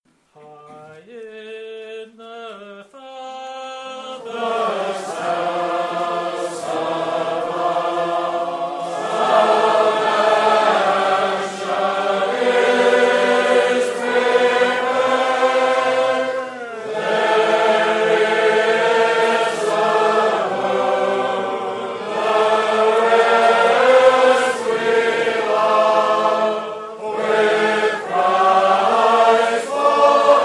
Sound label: Hymn; in the Fathers house above